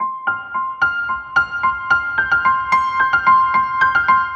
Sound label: Piano Run; Sonic Foundry (Sony Creative Software) - Rondo Brothers Trip-Hop Loops & Samples; Apologists